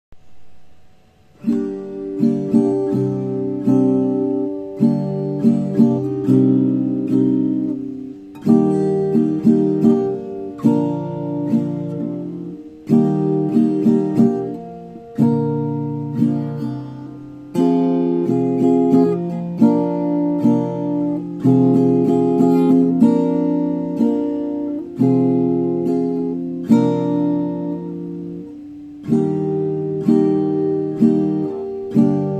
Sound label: Pink Guitar